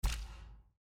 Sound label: toggle; endfield; gacha; toggle top